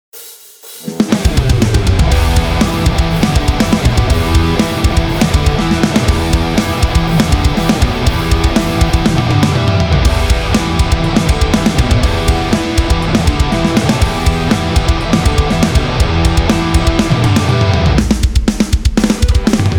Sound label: funky metal